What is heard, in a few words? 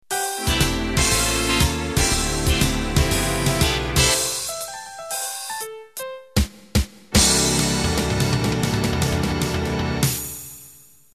Fox Trot End